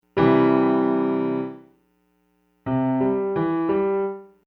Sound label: Arpeggio